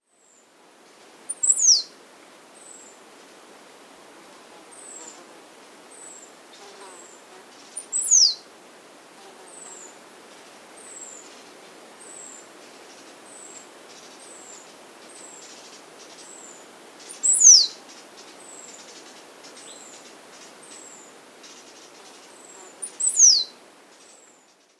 Yellow-Throated Tanager (Iridisornis Analis), Andean Birds; S. America